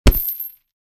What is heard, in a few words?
Boxing glove hitting punching bag